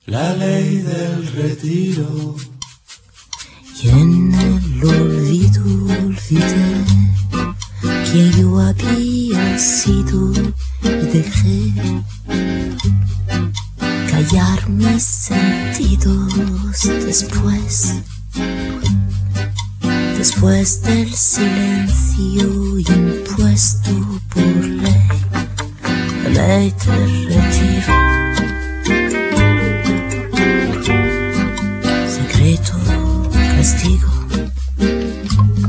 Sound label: Flamenco Chill